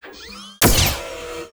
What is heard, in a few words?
GRE LAUNCHER, Weapon